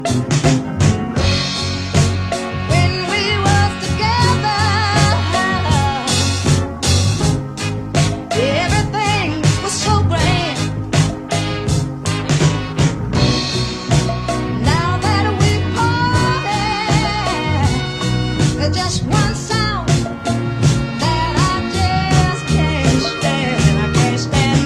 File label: high fidelity